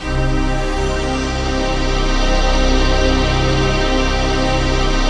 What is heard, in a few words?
PAD; PADS 2